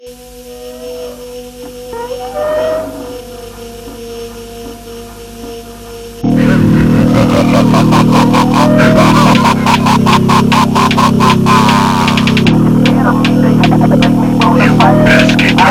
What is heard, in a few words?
Phonk Music; فانک phonk